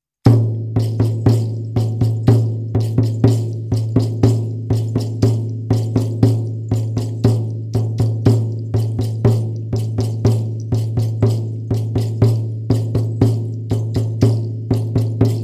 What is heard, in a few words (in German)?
Himalaya Schamanenrhythmus